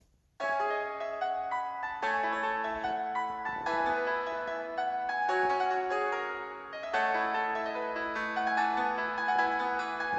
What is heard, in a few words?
Piano; NoGameNoLife